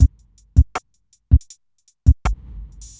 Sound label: DRUM LOOP ambient 160